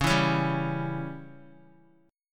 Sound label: C sharp Diminished C sharp down Guitar 4F 4A 5C 5G 5C 6E; C#dim strummed; JGuitar